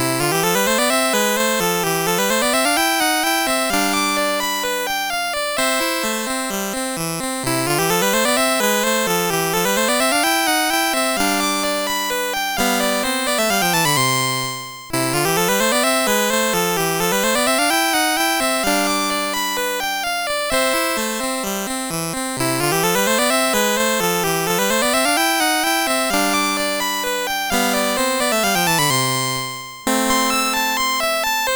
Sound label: MOS6581; MOS6581R4